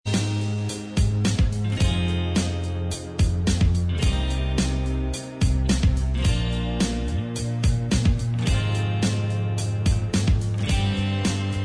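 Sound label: instrumental track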